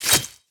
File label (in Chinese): 轻攻击命中